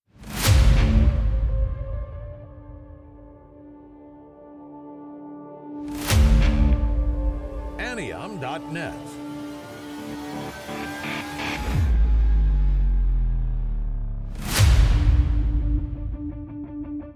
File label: hard rock trailer